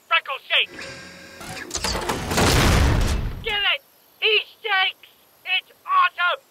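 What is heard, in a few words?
alert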